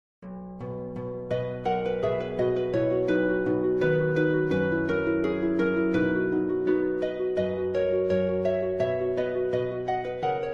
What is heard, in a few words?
Etude harpe; Bochsa; Etude16